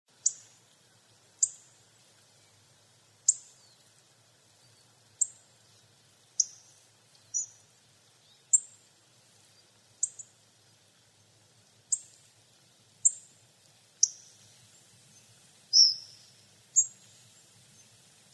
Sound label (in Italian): Frosone